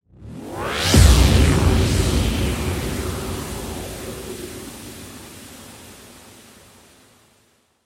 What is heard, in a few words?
club impacts sound